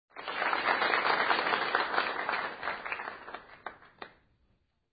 clap

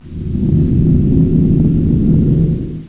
plane

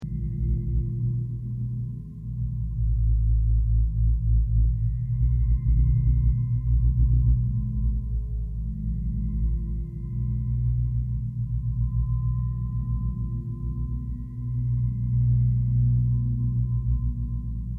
spaceship int

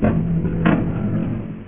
slider

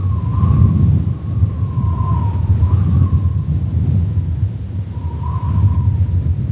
wind; halloween

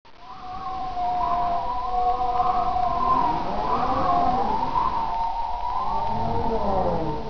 caves

